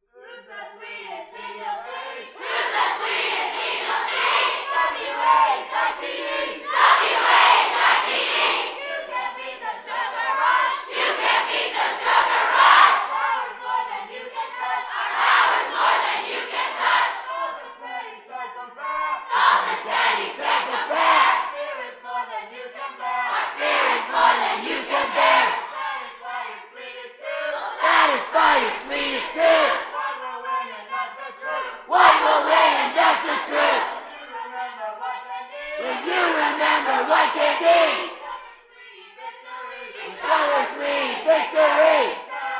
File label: Candy Chant